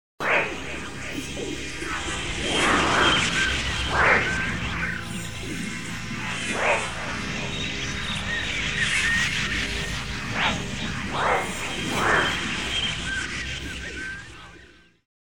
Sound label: environments birds